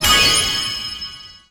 Ingame Bonus Waveclear

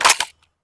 Sfx Jammed Weapone; Akxolotl

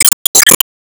Enemy Wood Poisonball Delete

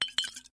Grab Bottle; Lethal Company

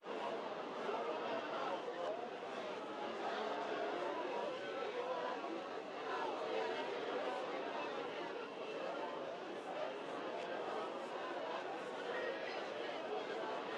Ambience Cafe; Fizzle

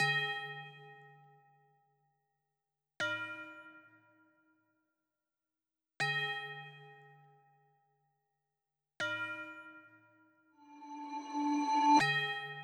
Synths 160

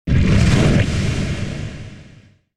Hand Lasers

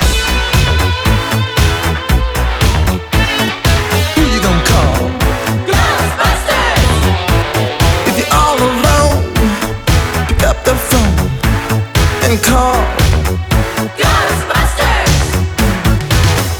Vocals - Chorus Vox Loop

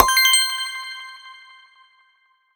UI UnPause; Skylanders Cloud Patrol; Arcade, iOS, Mobile

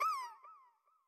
Bat Wind Up

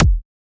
Kick

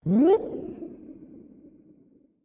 Dash

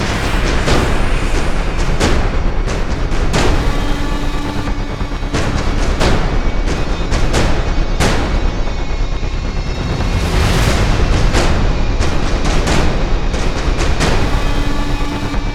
Base Loop low

